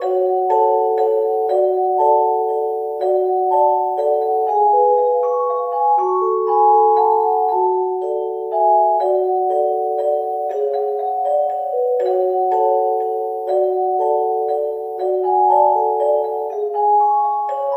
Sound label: celeste, playful waltz